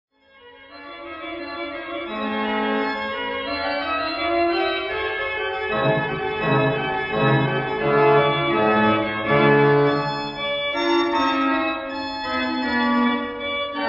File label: Concerto in d Allegro, orgel; Eersel; Walther